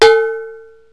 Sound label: hit pan, impacts; Wired for Sound Pro CD; Aristosoft, Inc.